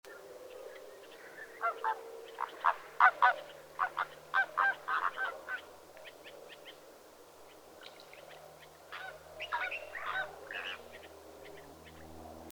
gflam; sewage ponds

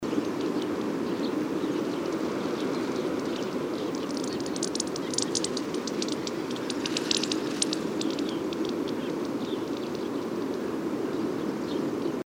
cornbun calandra FC; werb-see

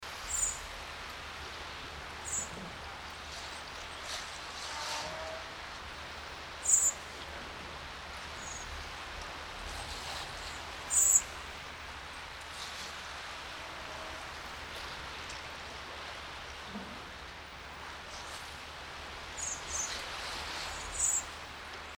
cbbird merula SEV FC; ms hydrograf

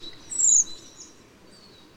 redw iliacus FL FC; terpitz

